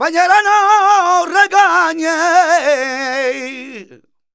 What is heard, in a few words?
voc c, Flamenco Vocals Male Cantaor